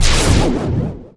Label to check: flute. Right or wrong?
Wrong